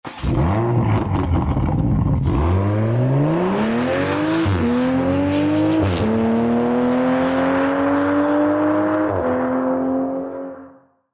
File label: Supra Ignition